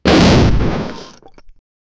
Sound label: a comical and mushy full, gore